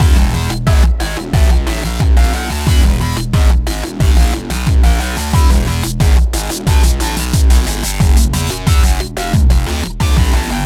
groove; ゆめ2っき

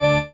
marimba grave; ゆめ2っき

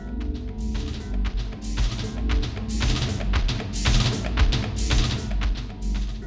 bgm, Music; ゆめ2っき

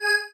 marimba spacesuit; ゆめ2っき

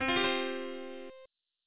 Startup

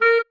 marimba penguin; ゆめ2っき

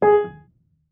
marimba piano; ゆめ2っき

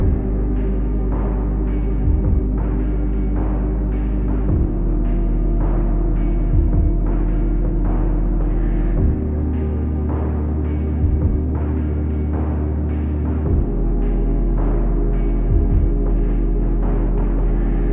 BEACH; ゆめ2っき

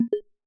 walk; ゆめ2っき

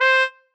marimba trombone; ゆめ2っき

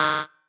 marimba bike

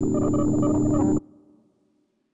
low drone; ゆめ2っき

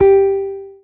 marimba guitar; ゆめ2っき

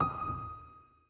marimba; ゆめ2っき

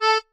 marimba chainsaw; ゆめ2っき

